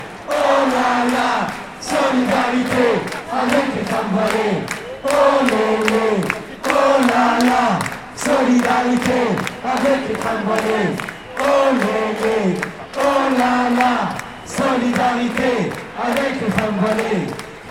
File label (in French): Chant solidarité avec les femmes voilées